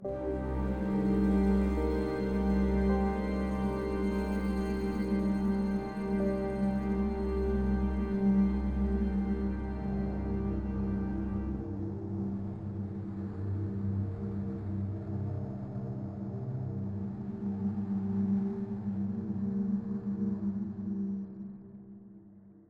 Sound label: Danger Drone; Dead to Rights: Retribution